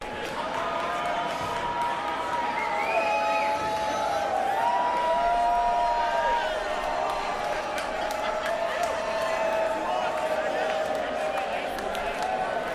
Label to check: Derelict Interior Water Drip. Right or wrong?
Wrong